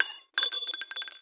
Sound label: Ammo Drop